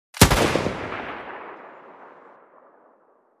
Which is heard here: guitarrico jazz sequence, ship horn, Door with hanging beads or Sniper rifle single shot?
Sniper rifle single shot